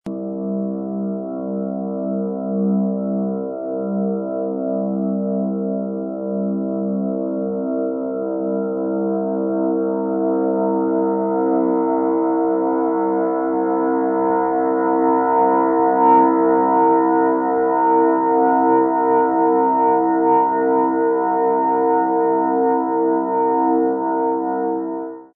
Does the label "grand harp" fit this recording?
Yes